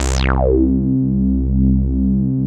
BASS, Analogon

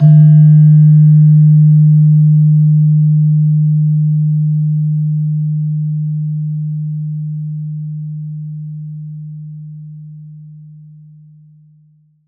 PELOG D1, PELOG BASS; Soundscan vol.60; AKAI

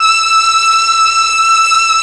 STR, M400; ILIO; Propeller Island Legendary

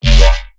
bass hit Happy Whomp F; Virtual Riot

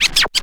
scratch short, Vinyl scratches

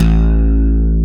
DEEP BASS A2; MX+BASS

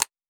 2 layers 2 percussions; M8; percussion and foley

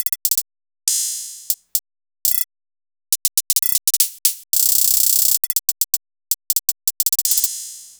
Glitch Hi-Hats, Chillout; Sonic Foundry (Sony Creative Software); Afterhours EDM; Chillout Service

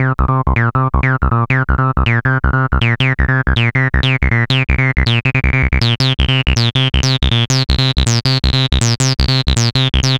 Acid Loops, 160; Dance Synths; Hardcore Loops

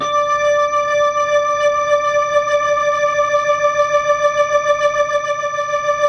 Hammond D; 3D Sound - 3D Keys VOL-1

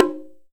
shime, Drums & Perc; AKAI S6000 CD-ROM - Ultimate

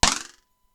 Simmons SDS-9 RM

